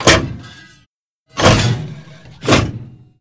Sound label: reload